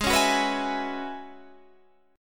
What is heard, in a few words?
F sharp 7th Suspended 2nd Suspended 4th, G sharp